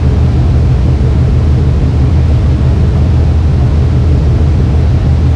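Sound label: pipe ambience; de mars facility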